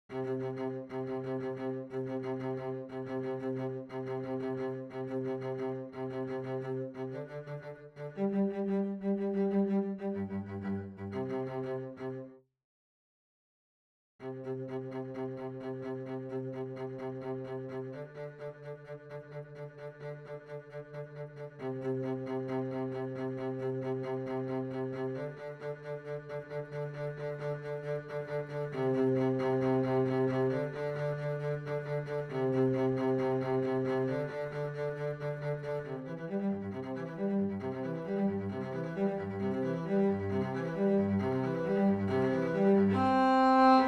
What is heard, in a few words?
Cello; Overture or Something